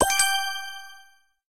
Item Check

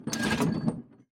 aquarium boss chain

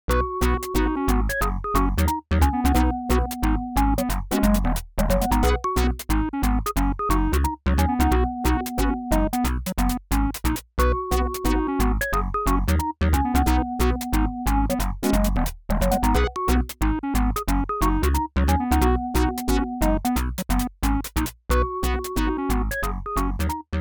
Bonus Stage; Férias Frustradas do Pica-Pau; Genesis

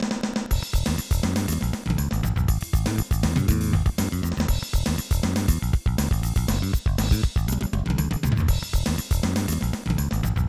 Bass

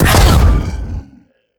Ghost Wave; Legend of Keepers

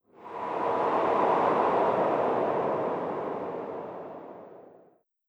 Wind Spell Sand

